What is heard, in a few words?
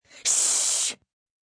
Shh Harsh, 1 child, long, human voice, kid, Whispering; speak, talk